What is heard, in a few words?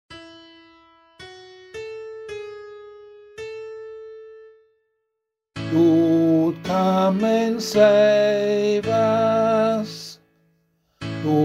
Psalm, vocal